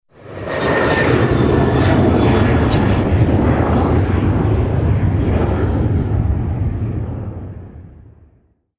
airplane flies past

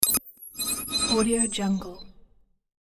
futuristic computer button press

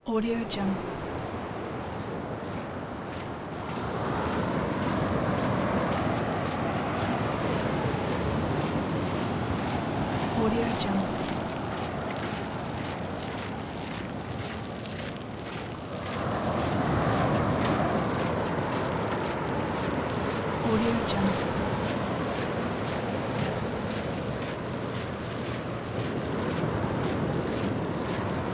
a walk on sand

